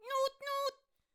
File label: Fan Bearer Attack Vocals